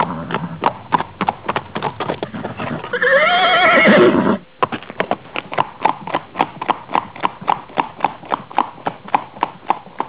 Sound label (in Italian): cavallo